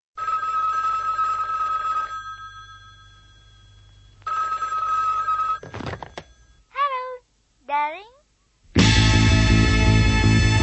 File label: Japanese Pops